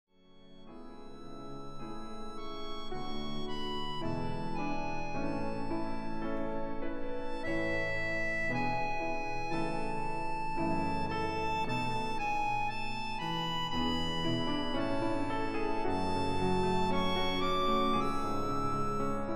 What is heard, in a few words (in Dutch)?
kerstmedley instrumentaal